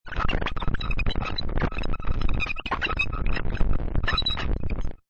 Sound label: Glitch, Digital, Mechanical, Robotic, Computer Malfunction; Multimedia; Warped